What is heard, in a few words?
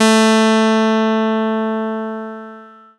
clav